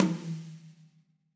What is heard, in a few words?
Tom 90s Heavy Rock; Drums